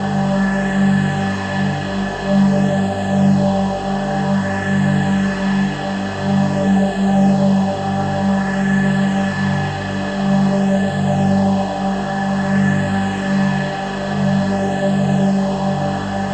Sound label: Vacuum Dron; Sonic Foundry (Sony Creative Software); Numina II